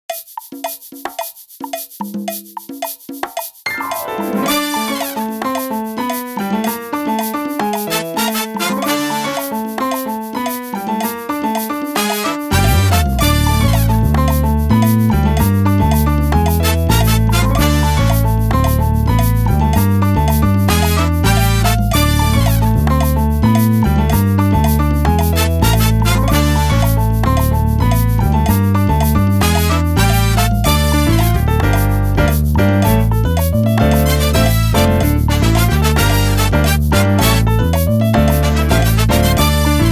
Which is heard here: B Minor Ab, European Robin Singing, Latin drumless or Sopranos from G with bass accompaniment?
Latin drumless